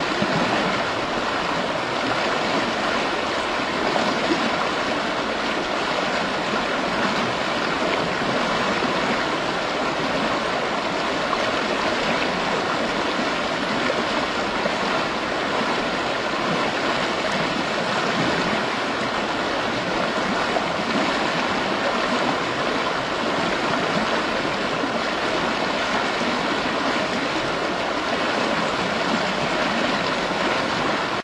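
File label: Ambient River loop; silent hill homecoming; ps3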